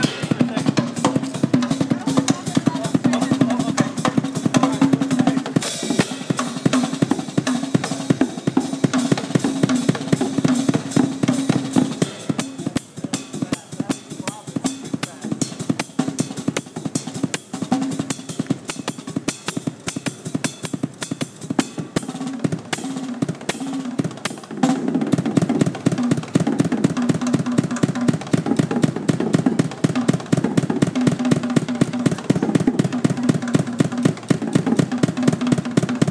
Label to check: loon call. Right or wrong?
Wrong